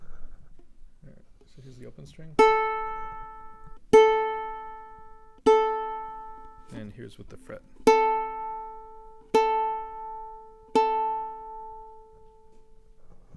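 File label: ukulele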